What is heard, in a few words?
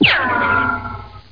Water Sheep Take Off